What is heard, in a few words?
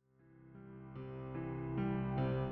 Flute And Piano